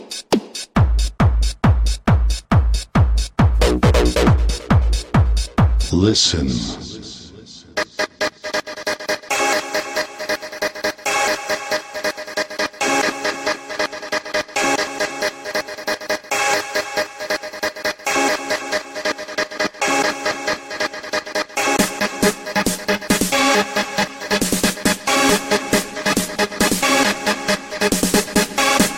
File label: Bass